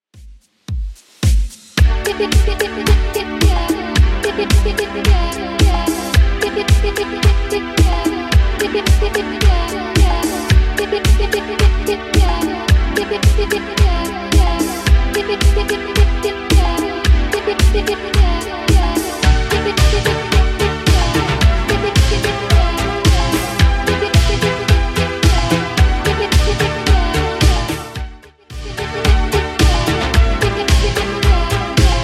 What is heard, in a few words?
80s Redrum 110bpm